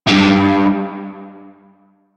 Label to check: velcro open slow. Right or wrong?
Wrong